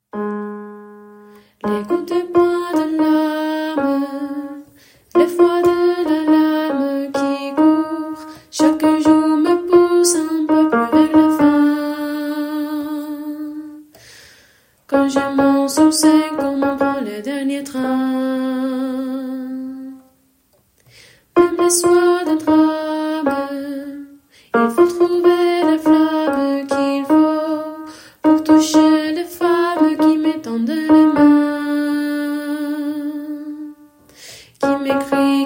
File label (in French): alti bas